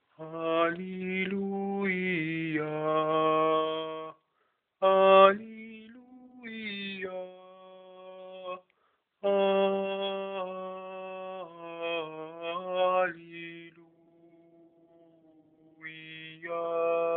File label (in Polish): Alleluia alt